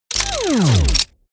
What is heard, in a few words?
wind down